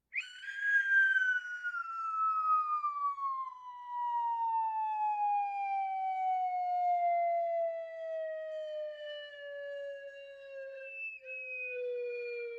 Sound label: falling whistle